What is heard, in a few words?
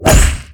Golf Club Sound Effect; Peach; SSB4; SSBU